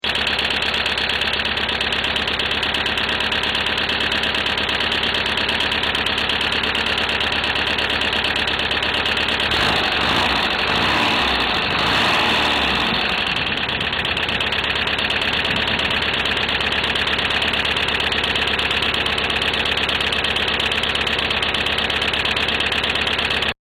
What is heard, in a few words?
4 Years Noisy Lifters, Engine Sounds